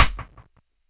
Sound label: RIM CLICK, DRUM N BASS KIT; DRUM N BASS:JUNGLE BEATS; DRUM N BASS KIT 2